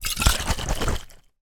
drink wine bottle tip shake

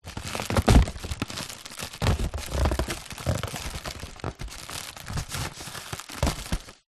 foley balloon foil helium handling

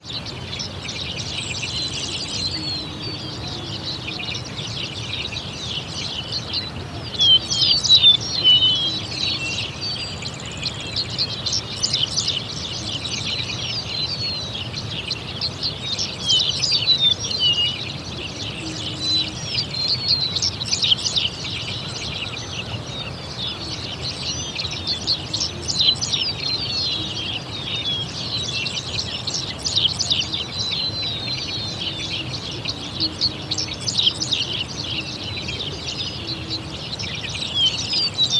ambience countryside birds oklahoma usa; felix blume

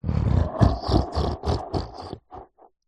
horror evil creature laugh; zapsplat

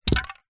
industrial rust spray can drop carpet

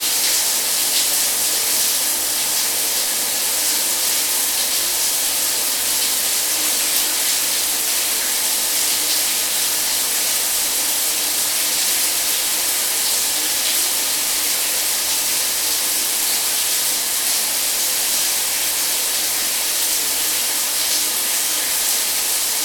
household shower running room; sound effects one

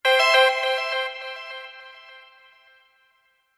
game tone musical end complete bright chime

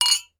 household glasses wine x2 clink; sound effects one

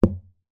dart hit dartboard; sport; zapsplat

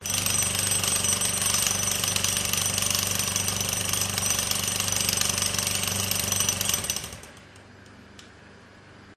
jackhammer drilling a concrete surface loop; Sound Ex Machina

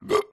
burp male; field reports

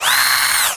science fiction robot movement

